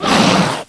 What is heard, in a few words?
Croc Attack; Playable Tutorial Level; TR1